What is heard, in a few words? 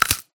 bone break neck snap crack